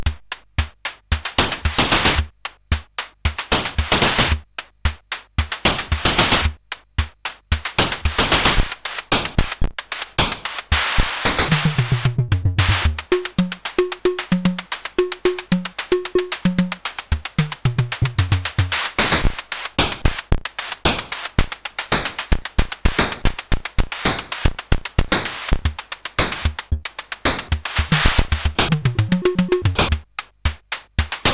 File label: sid CSG8580R5